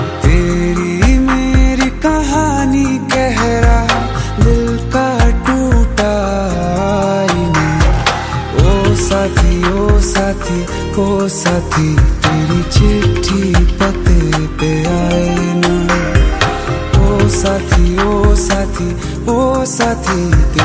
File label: Hindi Song Ringtone